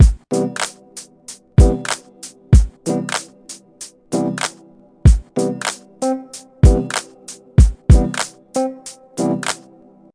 Loops - Dance Chill Out

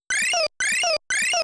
Pumping; game sound effect; Dig Dug

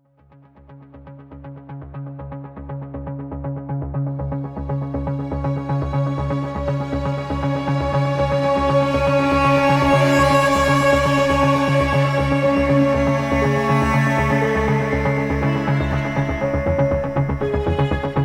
Heroic Action Suspense Oneshot

